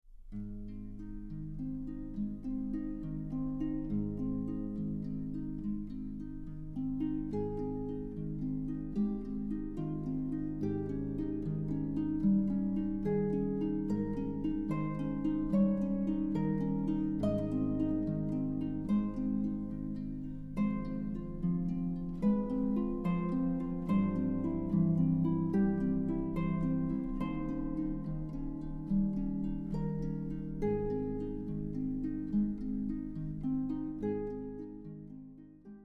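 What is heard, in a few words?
Bois Solitare, harp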